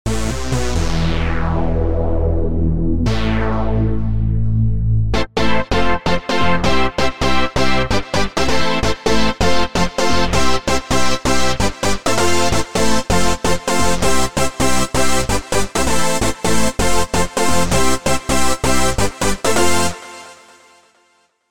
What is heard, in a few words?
4 OSC